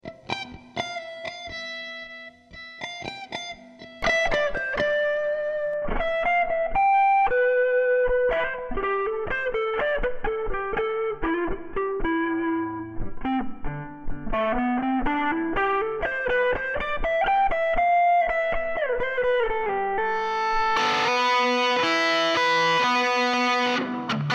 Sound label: Am 120 GTR Solo